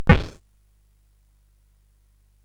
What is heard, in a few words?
SFX; Narco Police